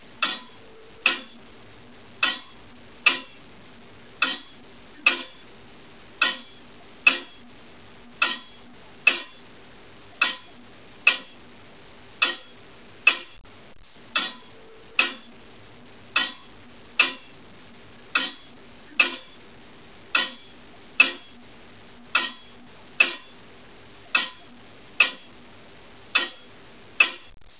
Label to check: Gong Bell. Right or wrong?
Wrong